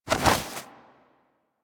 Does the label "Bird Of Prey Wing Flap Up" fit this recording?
Yes